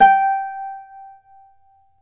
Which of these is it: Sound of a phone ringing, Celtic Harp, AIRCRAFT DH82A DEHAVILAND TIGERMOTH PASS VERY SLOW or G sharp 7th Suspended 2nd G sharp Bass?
Celtic Harp